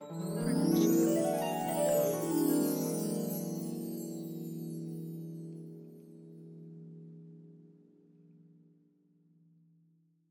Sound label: fantasy gliss; soundscrate